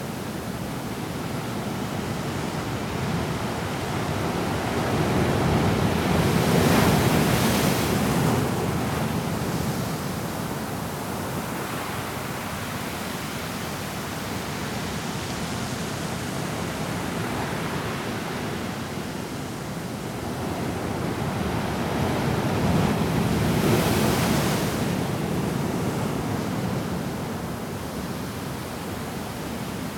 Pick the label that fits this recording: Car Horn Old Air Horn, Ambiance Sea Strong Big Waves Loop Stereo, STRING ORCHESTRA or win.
Ambiance Sea Strong Big Waves Loop Stereo